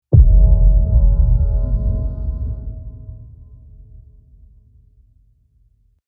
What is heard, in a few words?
Binary Hit Deep; Ninja Tracks